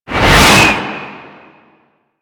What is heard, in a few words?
Tank Cannon Projectile Whiz; Enemies; Weapons; Warframe; Lasrian